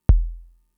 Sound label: Kick, Drum Machines Fricke MFB-522